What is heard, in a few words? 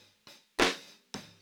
snare